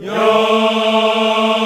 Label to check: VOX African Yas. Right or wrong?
Right